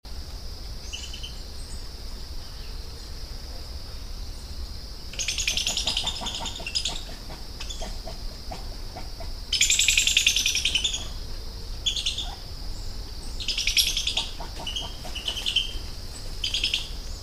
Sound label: Coati Sound